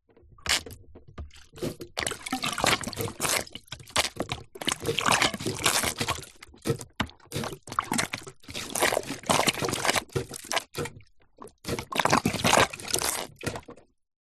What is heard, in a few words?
industrial pump siphon hand plastic squeeze squirt